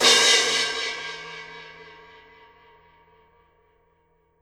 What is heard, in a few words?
Crash, Cymbals